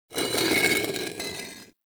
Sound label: Vendor Clay Shuffle Texture; Ambience; Soul Plane